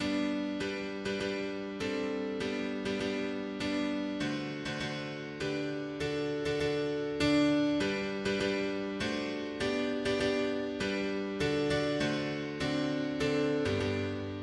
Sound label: mono piano; hymns; Himnario grande